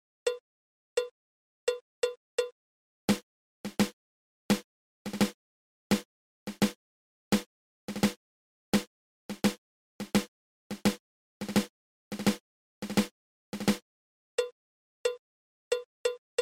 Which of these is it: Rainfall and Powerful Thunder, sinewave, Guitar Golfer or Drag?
Drag